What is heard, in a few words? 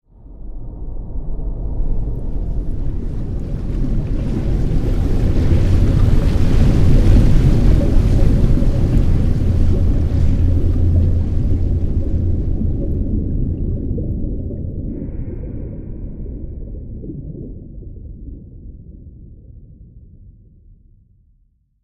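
Event Garden Sea Monster Moat Swim By; the unfinished swan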